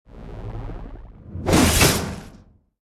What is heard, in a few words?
Skill Claw Swap